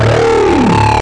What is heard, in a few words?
hard robot boss; GONNER